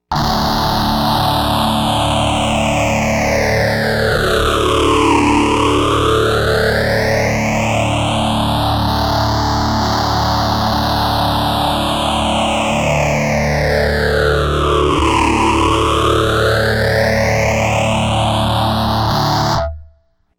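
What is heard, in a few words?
rat guitar tcxii re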